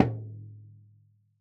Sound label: Bodhran, World Percussion; Cymatics; Emperor World Percussion